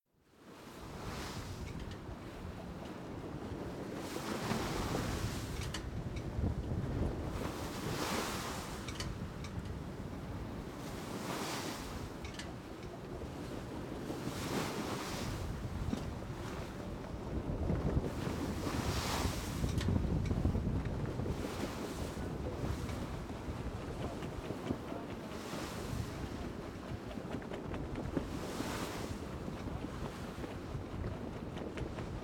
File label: Water waves wiper; KMP Film Video Sound Effects